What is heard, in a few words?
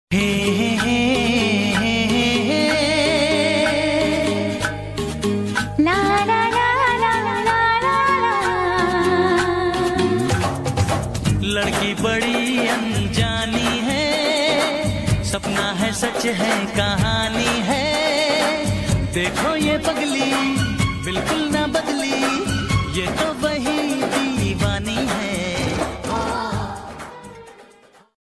love ringtone, hindi ringtone